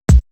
Kick, Drum One Shots

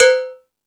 Thundadome, E-MU Pro-Cussion; drum machine

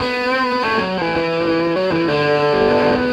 Krush, Electric Guitar

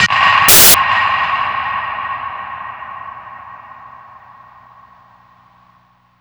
CHINKER, SPACEY DRUMS; Spectrasonic Distorted Reality 1

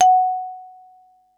mallets

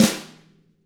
DW FLM, SNR; ILIO; Double Platinum Drums 1

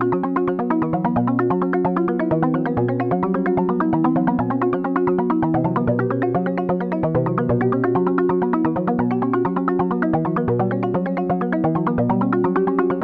KALIMBA; AMG - Global Trance Mission VOL-2

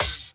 Dance Mega Drums, KIT D+B; Best Service

